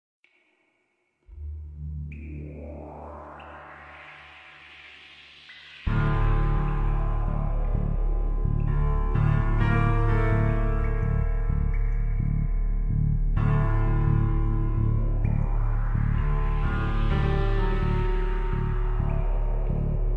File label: pop